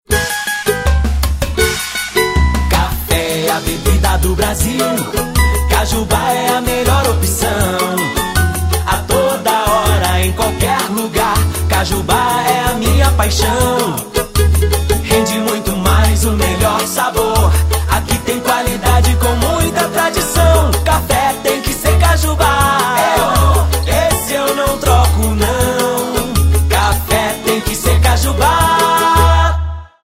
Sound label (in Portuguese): jingle cafe; cafe cajuba